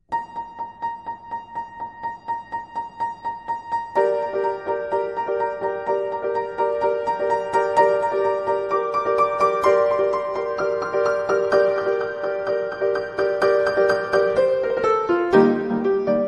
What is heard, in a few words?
Piano